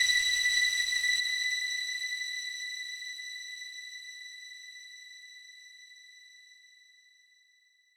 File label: lead